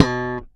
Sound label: Slap One Shot B, Bass; Bass X